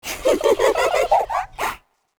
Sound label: chasing; chiabihamaru; cv project; invading executioner; zerosiki; slender